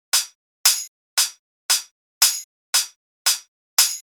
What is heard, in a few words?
adding hi hats; ampedstudio; How to make a beats